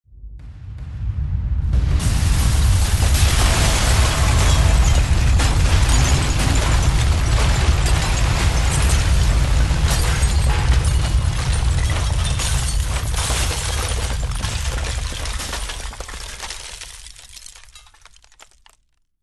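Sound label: sounds of construction and repair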